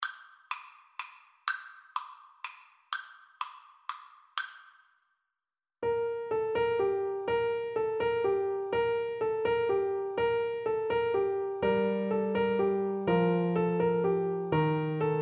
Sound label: carol of the bells, Tuba